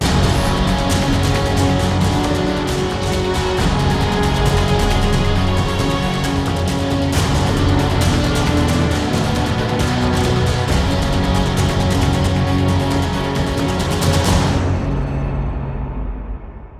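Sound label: Sting Sniper Fight Intro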